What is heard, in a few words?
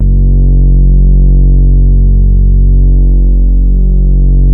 BASS STOP; Masterbits - Vintage Voltage; VV BASSES